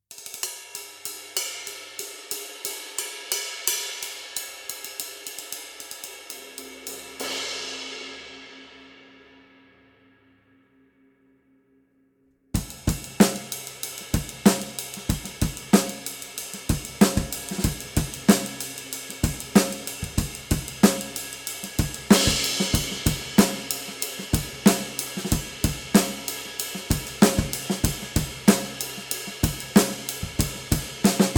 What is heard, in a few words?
Vintage Pure Light Ride